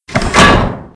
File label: Manhole Metal; Playable Tutorial Level